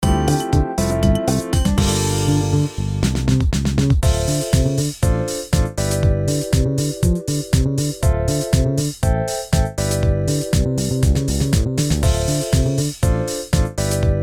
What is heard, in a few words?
Level Cleared; WiiWare